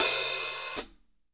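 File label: hat open close, drum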